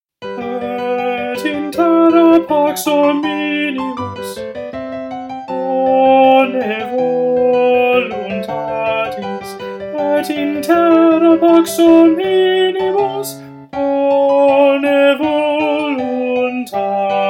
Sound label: Tenor